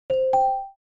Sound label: Simple message notification